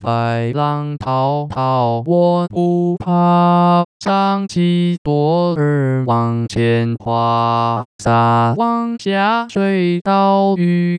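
Fishing Song male, Mandarin Singing Voice Synthesis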